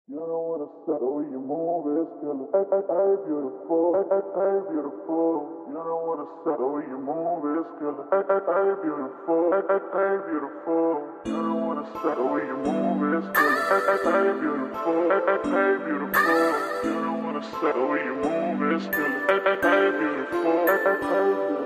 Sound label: Instrumental Tone